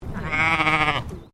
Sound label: Animal Sheep English Leicester Baa